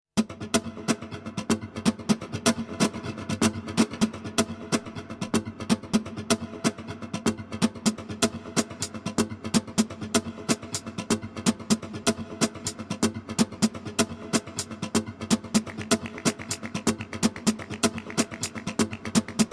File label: Havana Latin